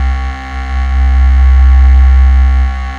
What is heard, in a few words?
MELLOW C2, SYNTH; Q Up Arts; Dream Experience